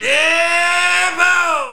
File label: lady sniff